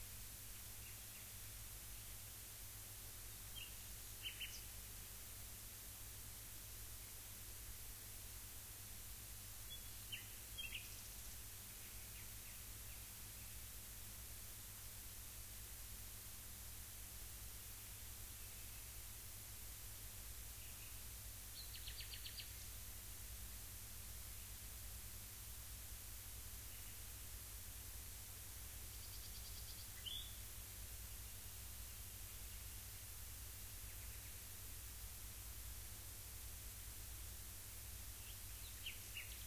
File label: rayol farm; SEAMED